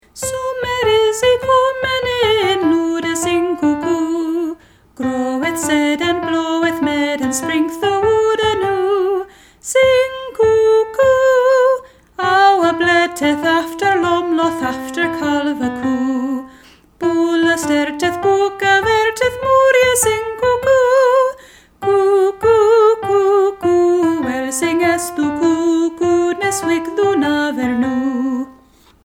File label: Sumer Is Icumen In Canon